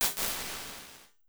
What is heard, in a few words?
Cannon; Apple